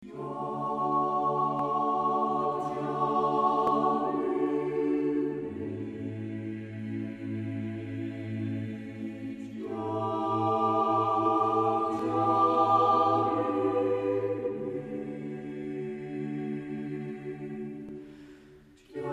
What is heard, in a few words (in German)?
Andachtsjodler; weihnachten